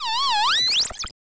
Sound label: Explosion